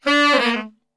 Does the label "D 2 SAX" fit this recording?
Yes